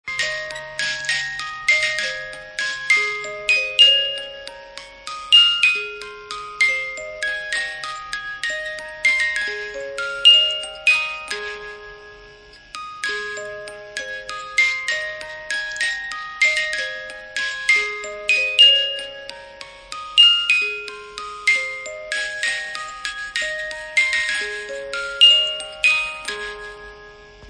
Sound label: auld lang syne